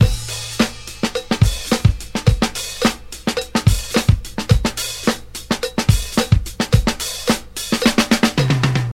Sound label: 108 bpm drum groove A key, kick tuned to the A note; drum loop